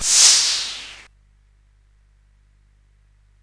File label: SFX; Narco Police